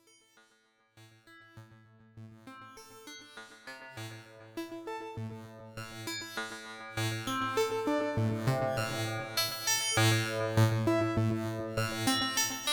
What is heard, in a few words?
ERICA SYNTH